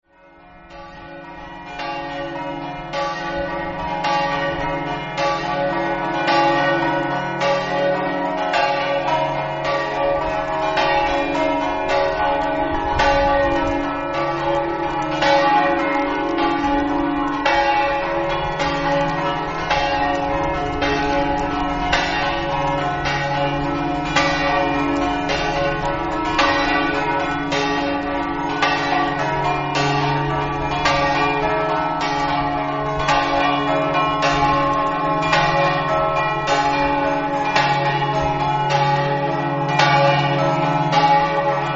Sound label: campane; sfloriano